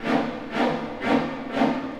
Orchestral Loops; 128 PSYCHO

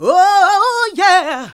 GOSP. Singin

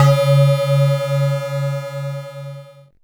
C#, BELL GONG, Synclav FM; Big Fish Audio

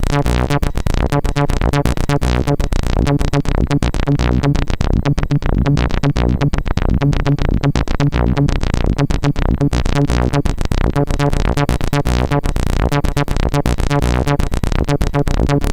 C64 Acid Synth FX; 8-Bit; Chiptune; Commodore 64

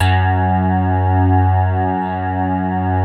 basses; Wizoo - Powered Wave

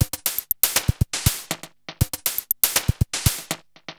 Beat, Industrial

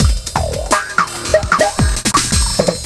Beats, Synchro Funk; Flowage; Sonic Foundry (Sony Creative Software)

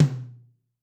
Room1 Mid, Tom, Yamaha DTXpress-II

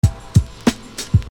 Beat, Hip-Hop Street Beats

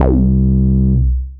303 Bass, Old Skool House; Big Fish Audio; OSH Bass

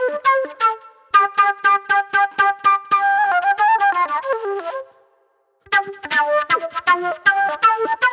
Old Soul Flute; Sonic Foundry (Sony Creative Software); Manhattan Flutes; Good Times

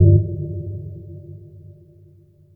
Ambient Tone; Misc

